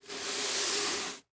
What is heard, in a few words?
Household Broom Sweeping Cement Floor Single